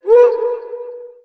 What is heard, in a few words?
"woo", male